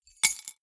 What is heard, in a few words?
key ring large drop stone; objekte; schluessel